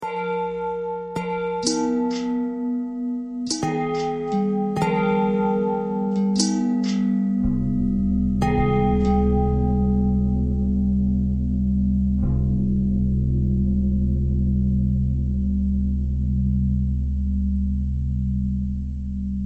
long bell bell thread pentatonic, bells

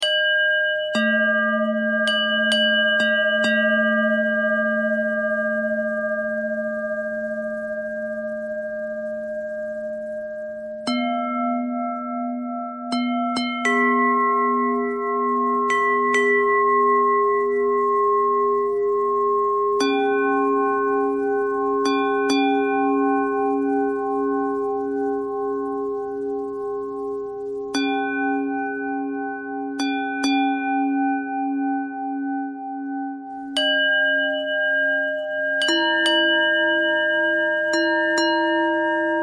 bell threads

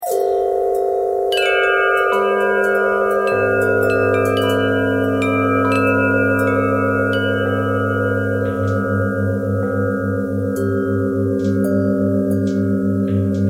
bell harmonic twist

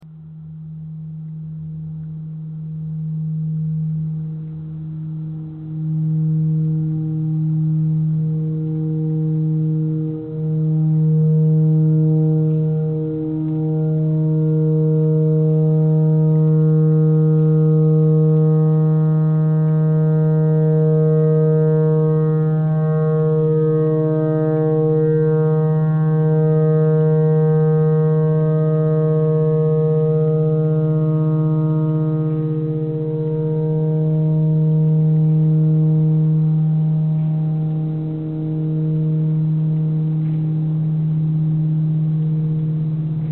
clarinet notes e tornado siren thread pentatonic

